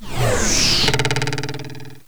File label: 8-bit Stereo Bullseye